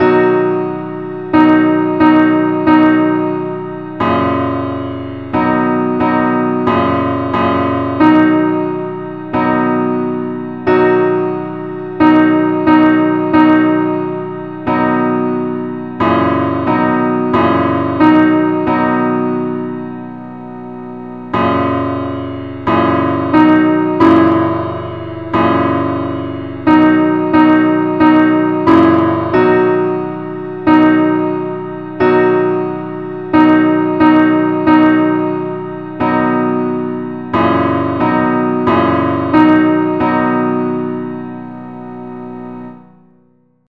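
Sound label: simple accompaniment key b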